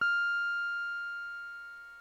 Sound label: bbm, Omnichord; OmnichordGodot